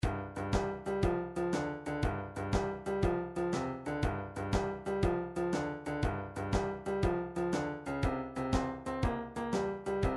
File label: midi drums, blues